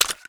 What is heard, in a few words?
ump45 clip out, weapons; Counter Strike Model Pack; CS2